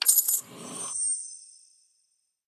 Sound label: popup money open